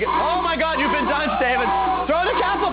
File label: dunce